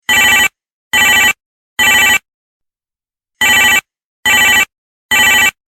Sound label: toy phone